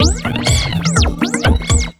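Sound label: 127 drum 2 L, Fresh Disco House; USB Soundscan vol.20; AKAI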